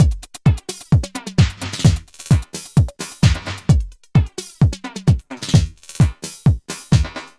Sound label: Beat; Crunchessor